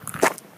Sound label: Stony Step, outdoor, Footsteps